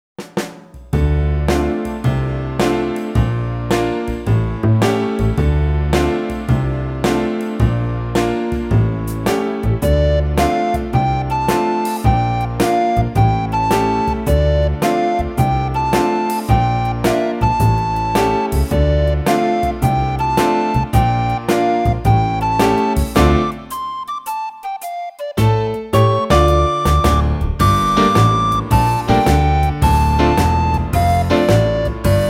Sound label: Deep Swing; red hot recorder tutor